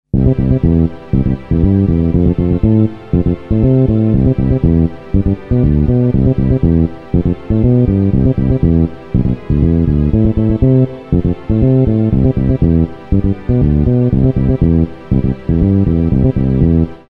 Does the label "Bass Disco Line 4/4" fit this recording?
Yes